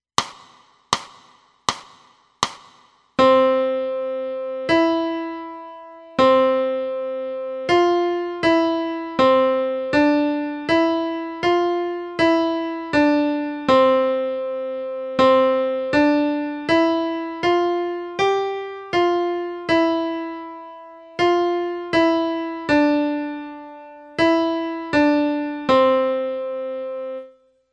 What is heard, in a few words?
piano